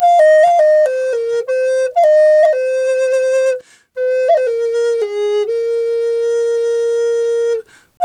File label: F 120, FLU RIFFS, Didgeridoo and Other Primitive Instruments; Big Fish Audio - Didgeridoo and Other Primitive Instruments